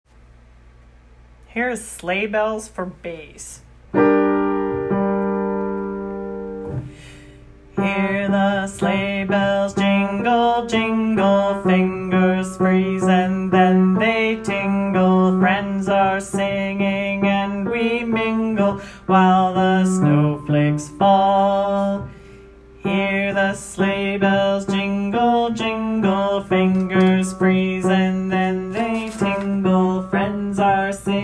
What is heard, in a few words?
sleigh bells bass